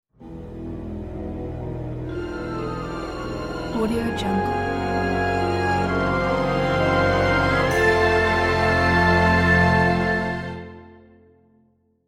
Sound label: surnrise start up